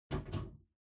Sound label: Pendulum Clock Hardware Far Hook Hang